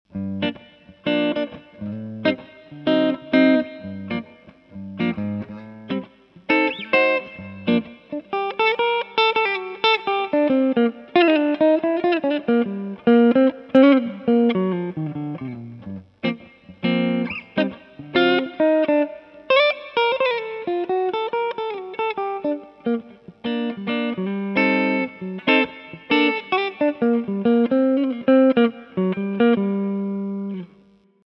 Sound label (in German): Gretsch Hals clean